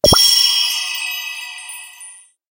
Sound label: bubble reward box act; game rip; line bubble